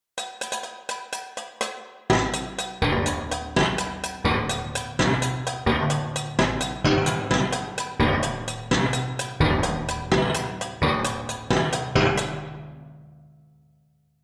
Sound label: pitch example metal